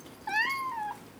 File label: Cat Meows - Food Time; Micro Pack